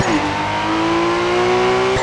third, vehicles cars; lwcars; niss skyline r32

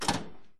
stop

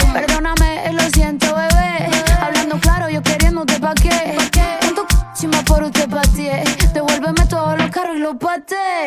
emote elegant lily music loop; actmod; fortnite

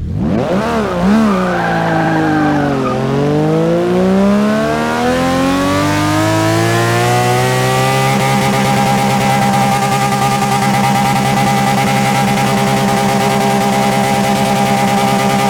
rev, vehicles cars; lwcars

